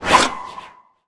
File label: Attack Whoosh; portal quest; android